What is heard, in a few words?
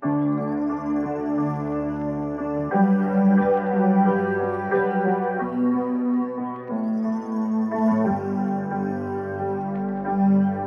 d gmaj7 b a g d gmaj7 gmaj9